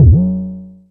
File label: Synth Bass Trampoline